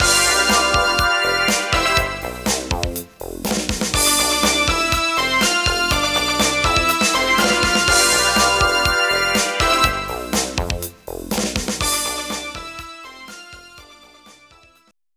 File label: Match Won; super sidekicks 2 the world championship; Neo Geo CD